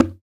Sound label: CONGO, African Perc; Spectrasonics; Supreme Beats; African Contemporary; SB Afro DRMs